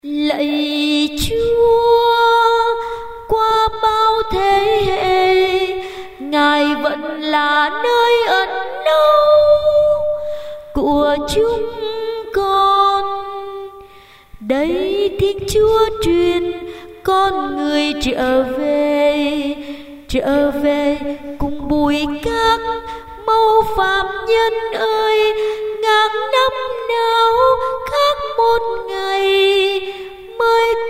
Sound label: sop alto